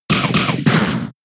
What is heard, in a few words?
Pluck; Pokémon SFX Gen 7 Attack Moves; SUMO USUM